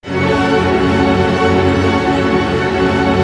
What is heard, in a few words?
STR ARP MI, Symphony Orchestra, STR Arpegg. Minor